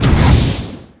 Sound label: spider monster whoosh